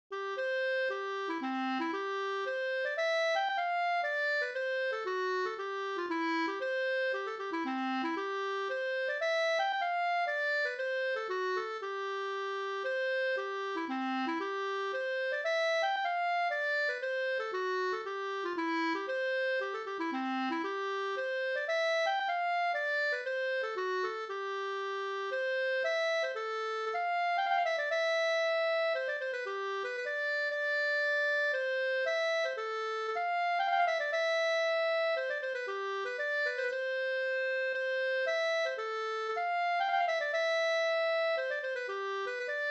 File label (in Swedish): polska Bodapolska